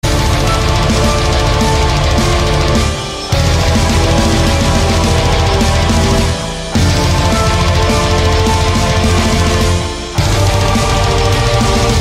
Metal; Soul Of Cinder

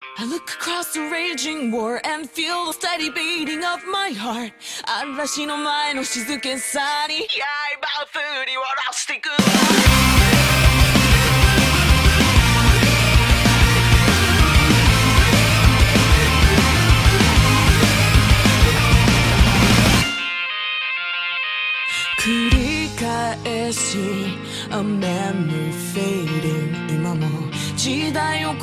Arpeggio

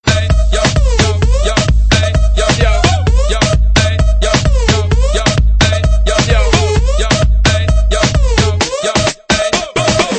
Dj Music

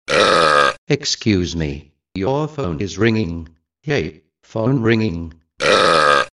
Burp Excuse Me

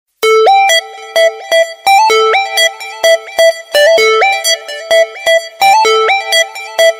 Metal Message Tone

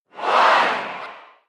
Crowd; Rocket League

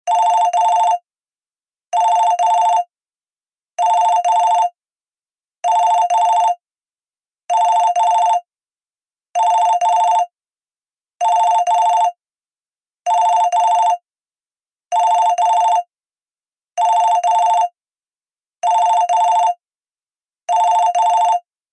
Alert Natural

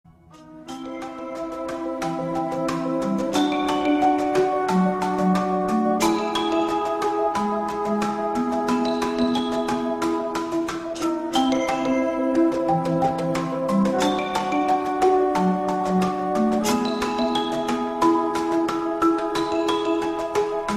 wow xylophone

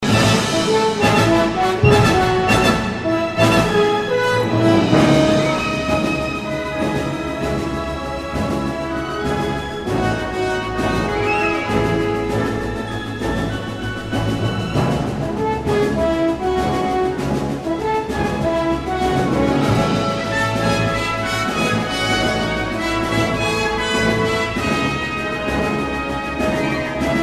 Passion Orcestral

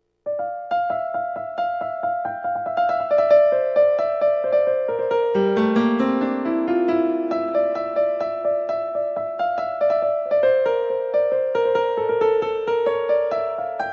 Lazy Piano

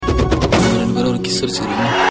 Kiss

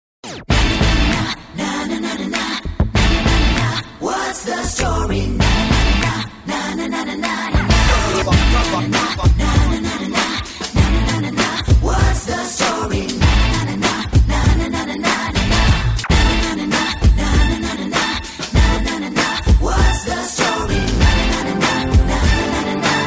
Humm

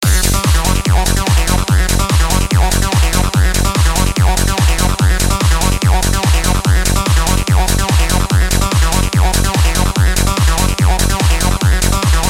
Techno Club

